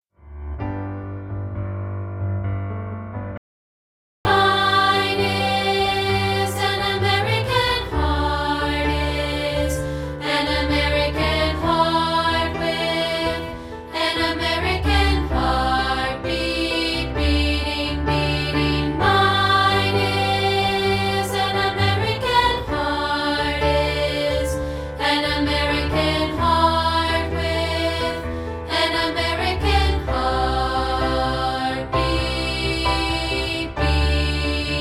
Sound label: American Heart Harmony part, choir; wildcatchoir